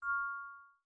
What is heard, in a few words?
spell; angband